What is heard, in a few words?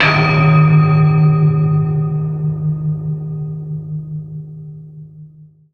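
percussion D, BELL+FLUTE; Best Service ProSamples vol.55 - Retro Sampler; AKAI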